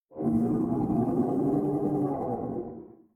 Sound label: Harpooned distant; Grounder; Evolve; Kraken